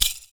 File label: FOOT PERC; Univers Sons - Raricussions